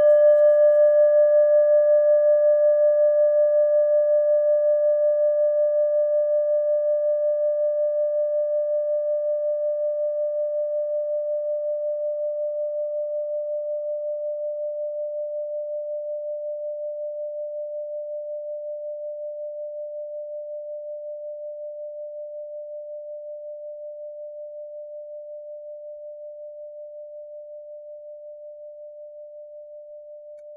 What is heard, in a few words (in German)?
kleine klangschale